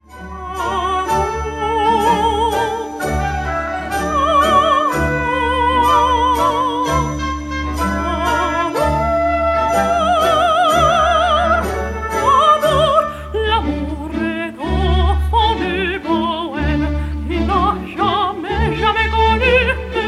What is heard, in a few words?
La Habanera Vocal